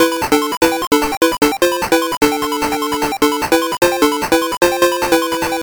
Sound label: (8-Bit Version) (Intro Only) (Fast); Sonic the Hedgehog FM; Sega Master System